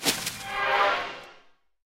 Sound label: Deathrest Casket Exit; rimworld